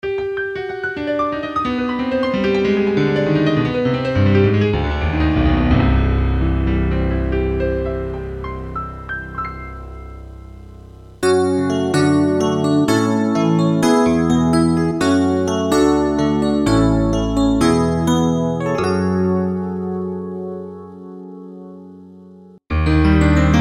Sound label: Pianos